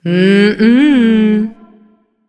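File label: disagree, female; smite; voicepack; hera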